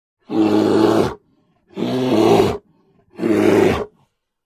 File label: Bear, Grizzly Roars. Multiple Roars With Breaths. Close Perspective.